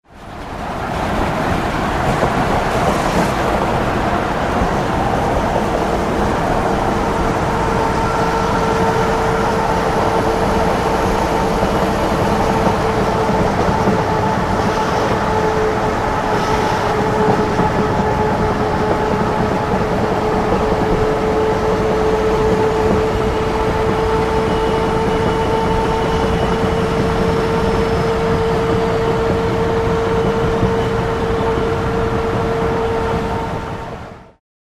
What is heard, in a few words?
Cargo Truck Interio